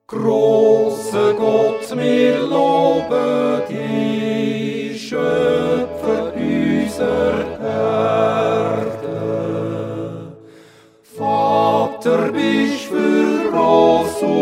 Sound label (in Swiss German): Grosse Gott (Quartett)